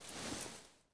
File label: body slide gritty, foley